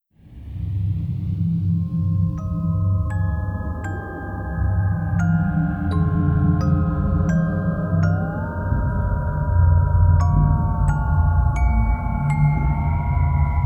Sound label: Horror version; Bright Kingdom Online; He came PK Horror version